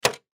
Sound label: Paper Cutter Arm Hard Impact; Office; Blastwave